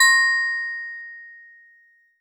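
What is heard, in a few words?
Steel Drums DX100 A#0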